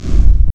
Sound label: bang; 3DShooting